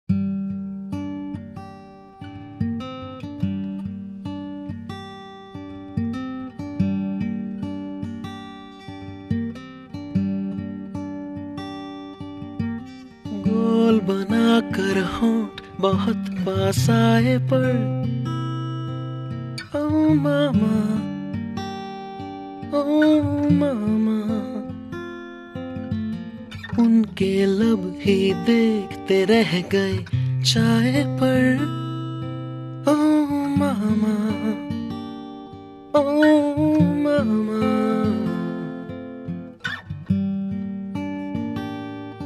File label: (Acoustic); 7 Khoon Maaf (2011)